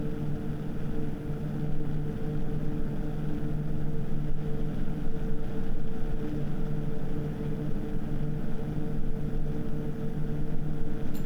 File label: Max RPM